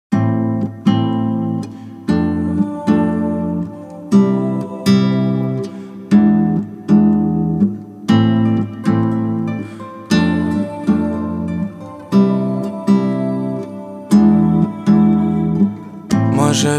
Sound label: Acoustic version